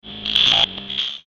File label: Creepy Alien Insects; Alien Sound Effects; science fiction sound effect; Andrew Fleming